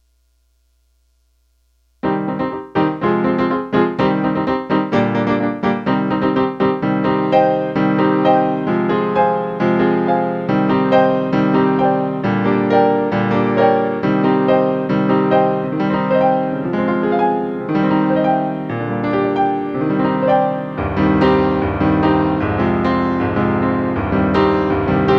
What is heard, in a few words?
prelude in c minor